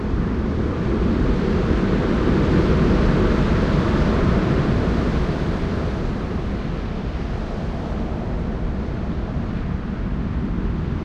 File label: ambient port default; World Of Warships